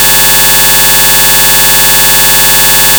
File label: 440hz tone